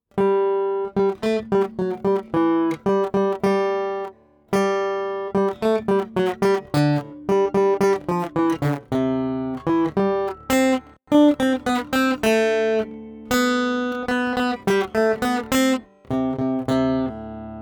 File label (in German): Melodie Kopf und Schulter Knie und Fuß; kinderliederquiz